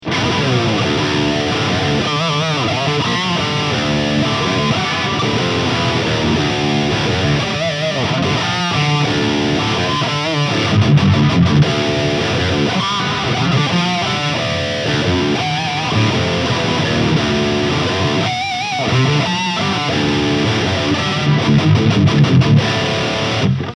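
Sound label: LTD SC 20 High Gain, guitar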